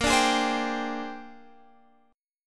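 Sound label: A sharp 13th chord, Guitar, Drop D tuning; down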